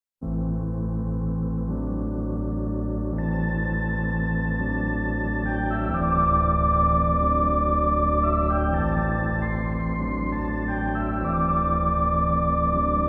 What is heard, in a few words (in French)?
interlude d'orgue